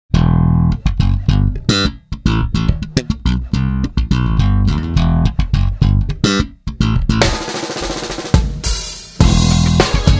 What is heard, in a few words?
slap rounds, lakland